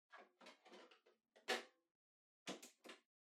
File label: Portable Stereo Far CD Put In Close